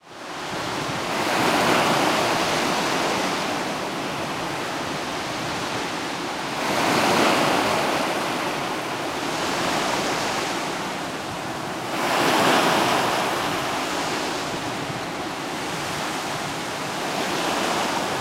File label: Ambient Waves; ffxiv; Orchestrion